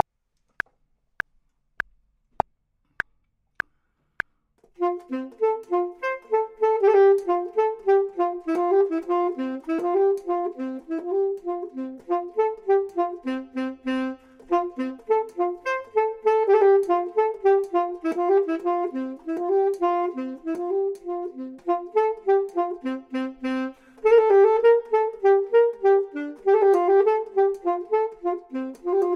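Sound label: bottom, Saxophone and Clarinet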